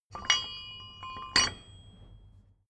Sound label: metal drawbar handling; industrie-wirtschaft